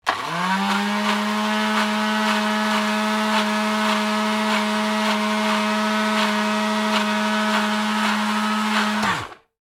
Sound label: food processor, household sounds